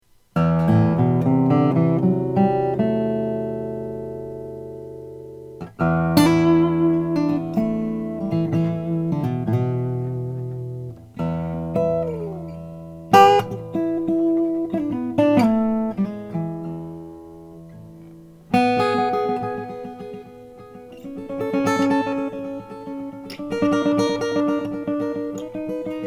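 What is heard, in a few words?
Froggy Bottom model C sinker mahogany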